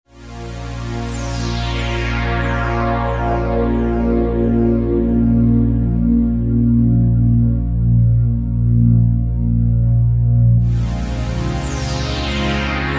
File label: Roland V-Synth; DblSweeper; Factory Patches